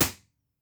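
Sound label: Live Room impulse response; Physics and Electronic Engineering Buildings; B-Format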